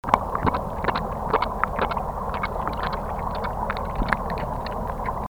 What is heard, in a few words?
Cough of the River Herring; soniferous